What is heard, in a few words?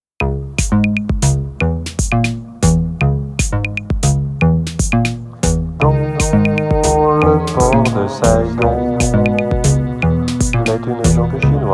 French Synth wave